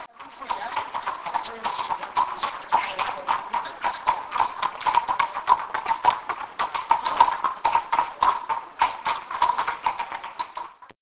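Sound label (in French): cheval trot; Cheval 001 (au pas); sons-nature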